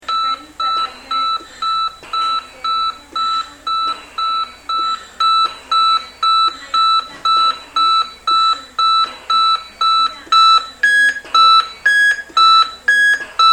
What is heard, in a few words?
servo 300, alarms, medical